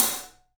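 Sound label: SABIAN HAT, left; 3D Sound; 3D Drums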